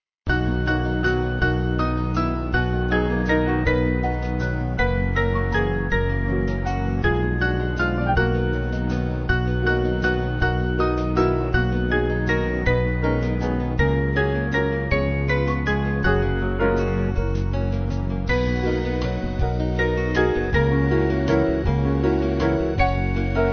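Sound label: Band, Music Backing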